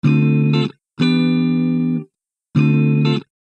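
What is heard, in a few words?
funky guitar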